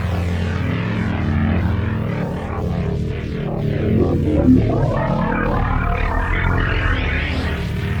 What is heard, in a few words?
Static Arp; Sonic Foundry (Sony Creative Software); Ma Ja Le Ethereal Textures; Noise Percussion